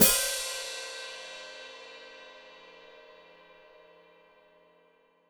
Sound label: Hat Closed Open, Hi-Hat